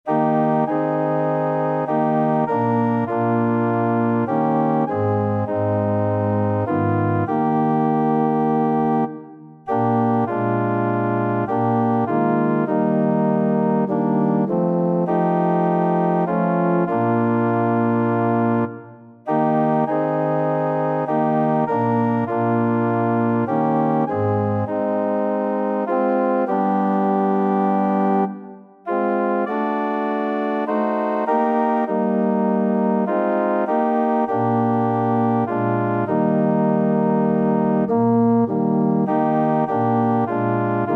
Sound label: living for jesus, organ